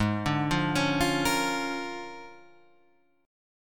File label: A sharp 7th Sharp 9th